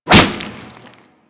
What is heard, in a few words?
Infested Whip Impact Default